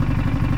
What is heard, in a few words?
piston prongs loop; weapons; dota; bristlebog